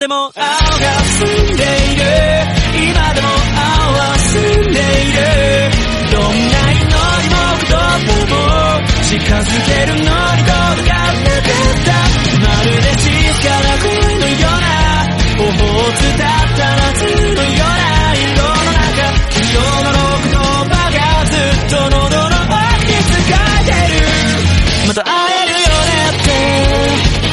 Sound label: japanese song